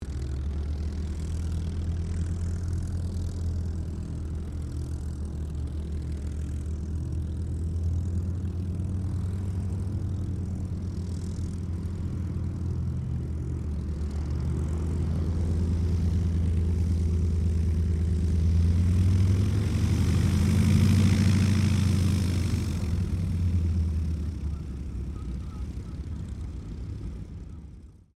AIRCRAFT CAC CA-25 WINJEEL TAXI SHUTDOWN, fighter trainer; RAAF Winjeel; Airplanes; Vehicles